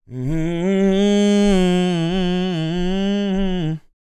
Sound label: MOANIN, Vocal Planet Blues & Soul